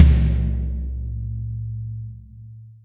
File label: Drums, TOMS; Best Service; Real Mega Drums VOL-1